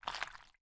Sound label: Liquids honey water